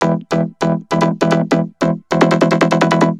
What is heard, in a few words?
Vocobeat; Sonic Foundry (Sony Creative Software)